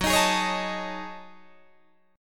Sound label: F sharp Minor 6th Add 9th, Guitar, Open D